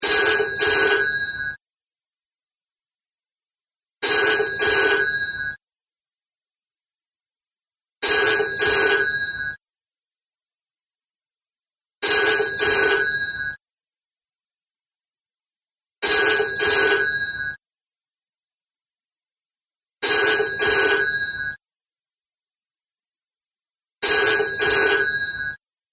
Double Ring; ringtones; Classic Rings And More

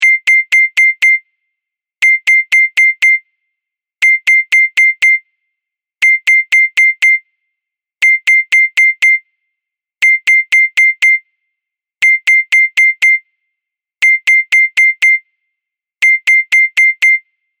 High Chime, ringtones

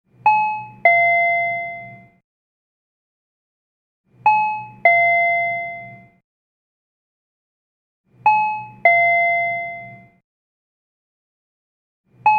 Retro Announcement; ringtones; Subtletones

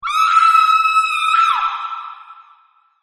Woman Scream; ringtones; Halloween Horror

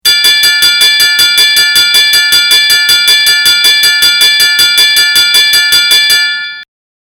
Loud Bells; ringtones

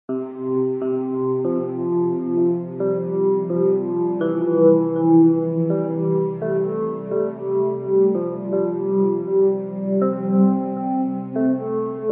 Morning Hymn; ringtones; Wake Up

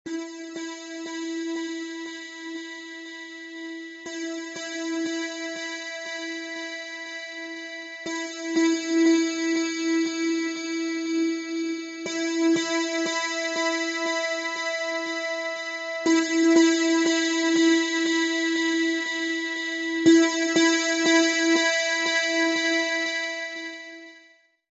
Gentle Tone; ringtones; Wake Up

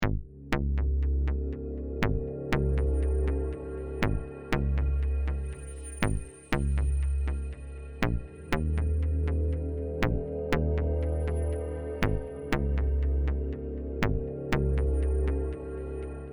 Dark Echo; ringtones; Subtletones

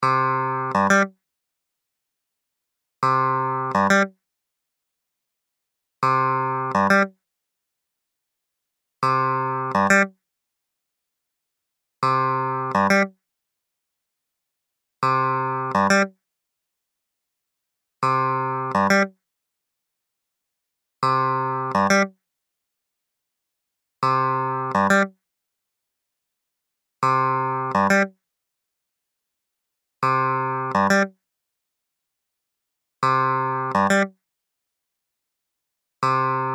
Twang Snap; ringtones; Crystal Clear

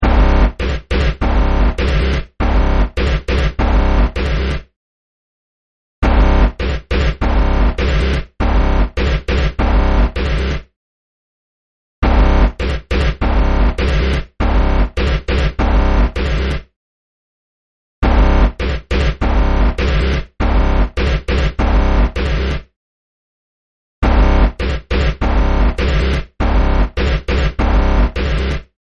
Broken Robot; ringtones; Classic Rings And More

